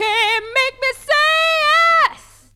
VOC

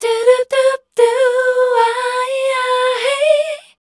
A, Voice Spectral; MELODIC; Best Service; Voice Spectral II

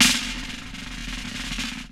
ROLLS; E-Lab; X Static Goldmine 2

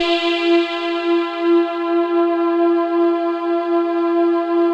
ATMO PADS; Best Service; XX Large

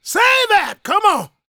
SHOUTS, Gospel, PREACHER; Spectrasonics; Vocal Planet Vol.1 Gospel

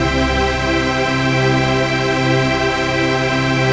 RAVE PAD; Best Service; XX Large; Pads

